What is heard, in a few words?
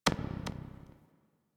UI starchart click node; Warframe; Starchart Redux